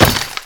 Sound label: nail die